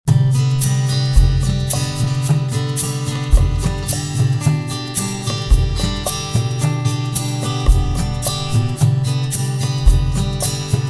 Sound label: loop relax